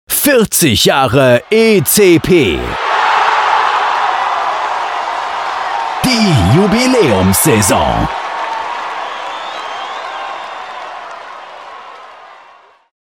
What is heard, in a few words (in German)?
flanger atmo; 40 jahre ecp